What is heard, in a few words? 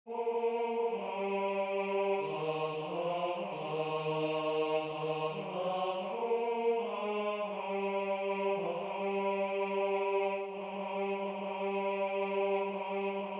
Baryton; CHANTON PIPERRI; Hymno final; Buenaventura Zapirain